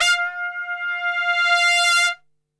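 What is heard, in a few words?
F 3 trumpet, Brass Super Section; Best Service; TRP SWL; 90 s Sample CDs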